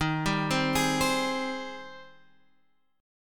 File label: G sharp Major 7th